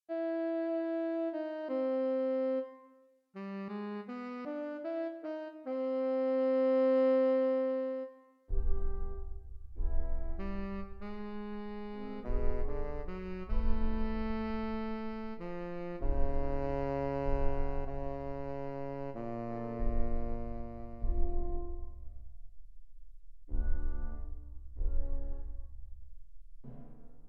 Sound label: sax band